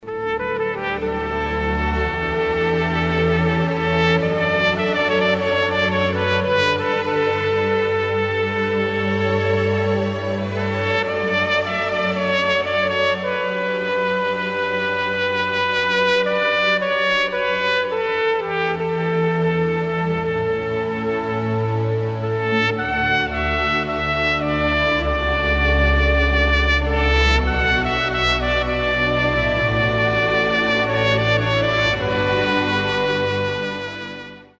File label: oboe